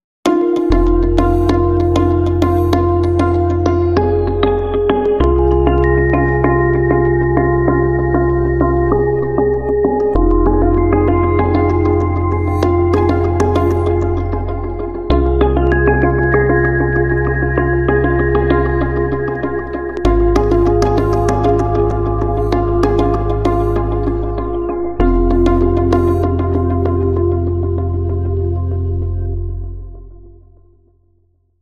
No Perc

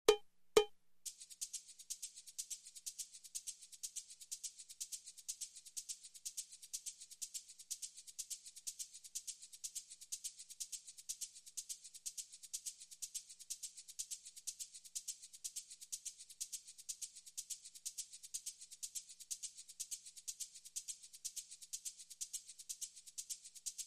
Beatnik Loop

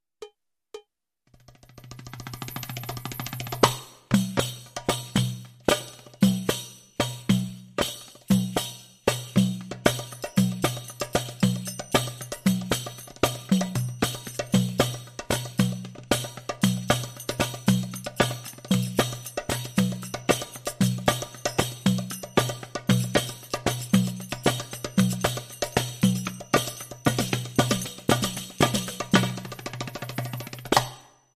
Arabic Loop

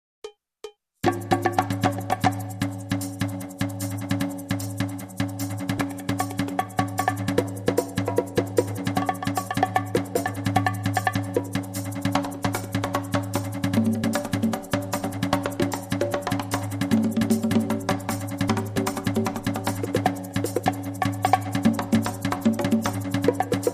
Conga Bongo Loop

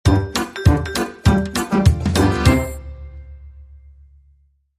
Chuckles And Laughs

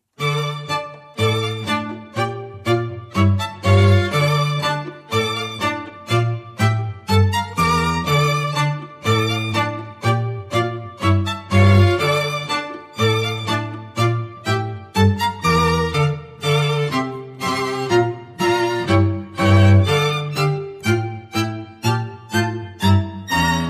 Resolute Strings Only

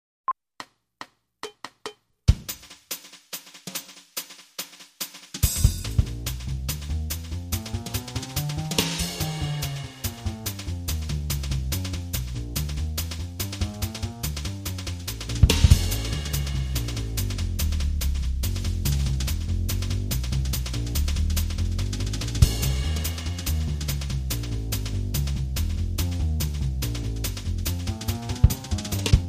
Jazz Brushes Loop

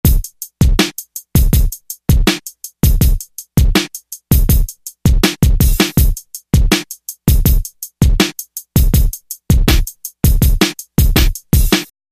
Robotic Drum Loop

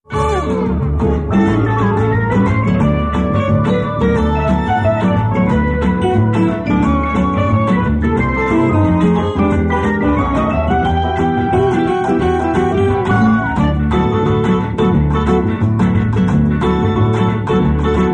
Beach Bossa

